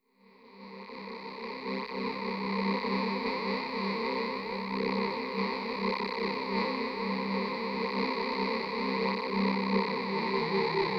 updown; jurgen Haible; matrix fx; synth

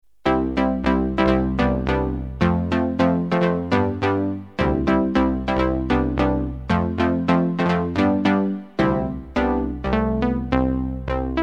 synth, polykorg; jurgen Haible

